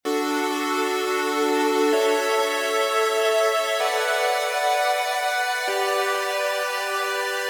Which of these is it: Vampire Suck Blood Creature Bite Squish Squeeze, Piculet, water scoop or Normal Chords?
Normal Chords